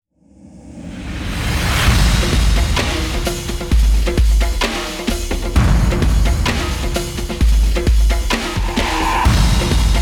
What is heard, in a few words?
percussion